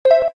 Keytone ok; LG SV850 Wine 4

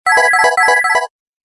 Serene tone; Samsung GT-i7110 Pilot

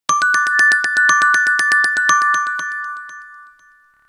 ring, standard; HTC S740

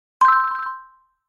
Fold open; Nokia; 7390

